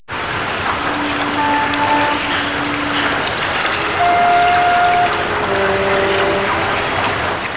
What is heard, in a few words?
nautical